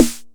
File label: 909 snare; 300 Drum Machines